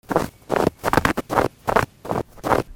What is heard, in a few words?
FOOTFALL